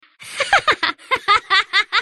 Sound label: willow laugh